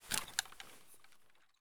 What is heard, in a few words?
grach mag pull out; Escape from Tarkov